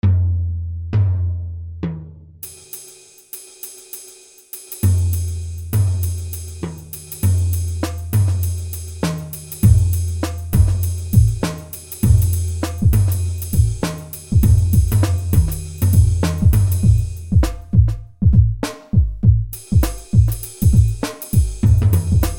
Groovy Tom Hop

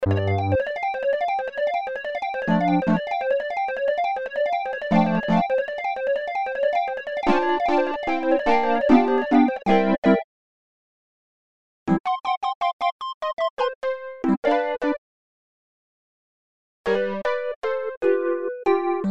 e piano loops

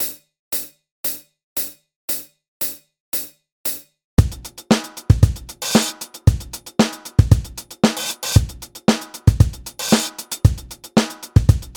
Blue Funk

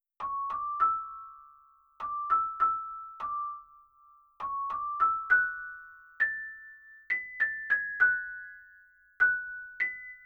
toy piano A 100bpm

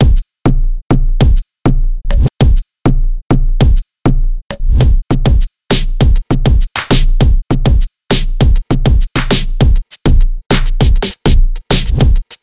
dancehall kit

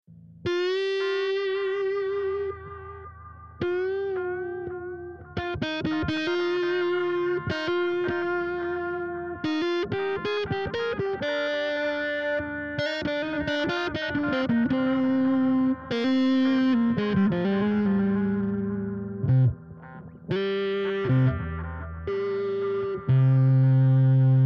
C 120 Elec GTR solo chords

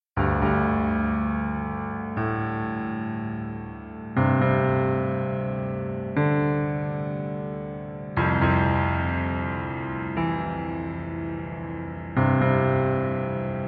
piano meadow theme

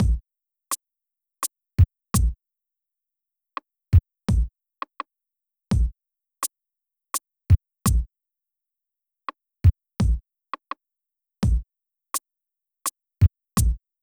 drums

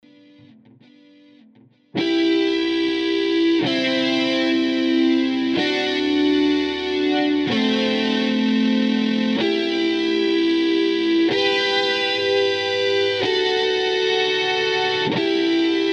Jam

